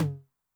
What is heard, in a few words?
HI TOM, Noise, 909